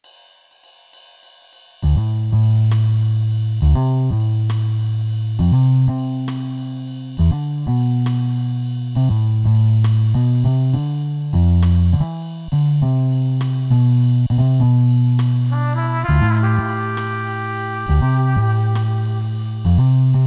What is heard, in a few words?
easy street jazz, music on hold